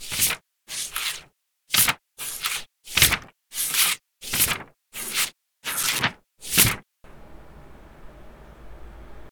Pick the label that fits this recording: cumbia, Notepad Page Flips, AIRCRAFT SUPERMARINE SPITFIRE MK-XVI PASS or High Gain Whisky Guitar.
Notepad Page Flips